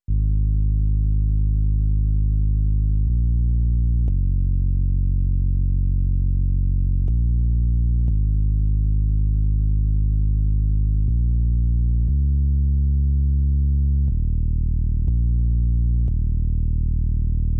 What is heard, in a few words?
Cliffs Pads Bass